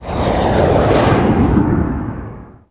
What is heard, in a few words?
Jet Run; Civ4 warlords